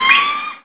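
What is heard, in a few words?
eagle screech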